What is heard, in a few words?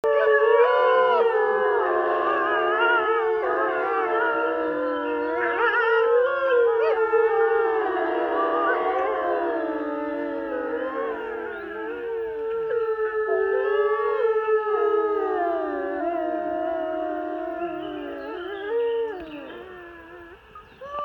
wolf howling